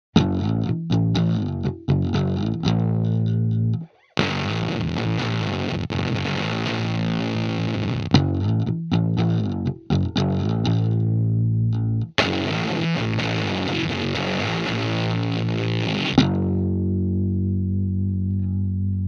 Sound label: bass